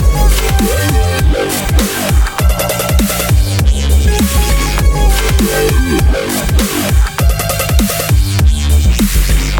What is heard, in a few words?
emote founders music; fortnite; athena